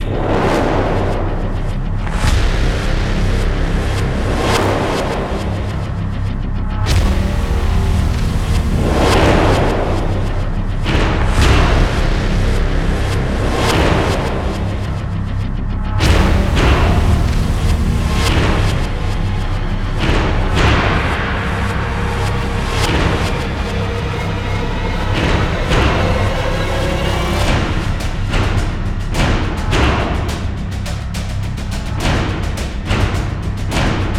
Base Loop low

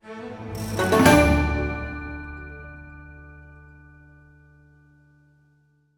stinger Chest Opened; lego horizon adventures

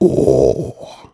Gong - Peep

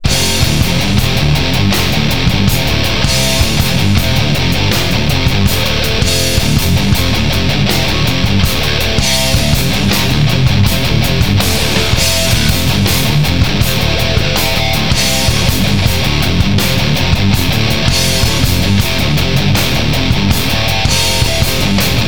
Rock; Beavis and Butt-head Do Hollywood; PS1

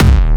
Kick

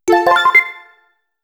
bonus collect award; Eradicator Genesis; Switch gamerip 2025

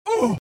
Mom Vox Hurt

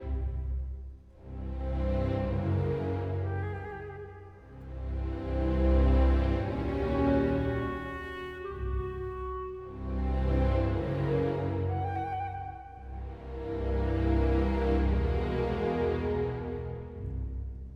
85bpm 3-8meter; Tailo Village C

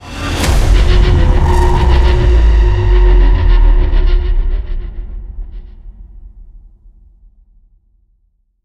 Stinger Modulate

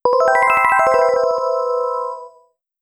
Effect FM Synth

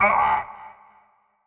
Marv Hurt

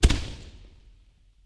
fireworks

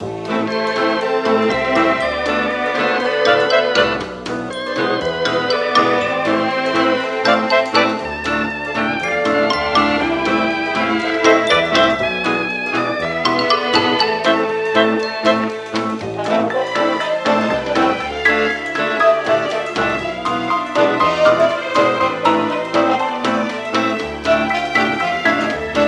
gramaphone Django Style Weirder vinyl; Don't Starve Giant Edition; PS Vita

